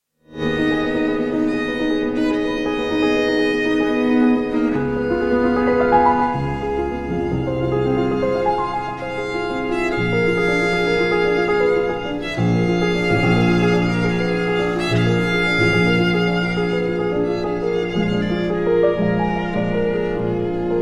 trio in E flat major notturno for piano and strings; franz schubert; d897